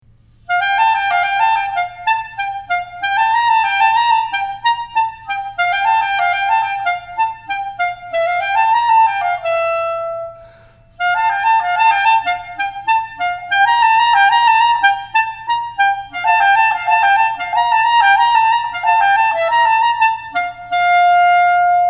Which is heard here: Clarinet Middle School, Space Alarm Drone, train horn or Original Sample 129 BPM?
Clarinet Middle School